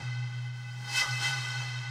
bottle, ethno, 125

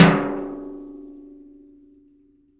hit, rust kit; Nucleus Soundlab; Ambient Drums

